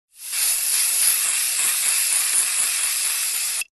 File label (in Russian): Звук средством от комаров и москитов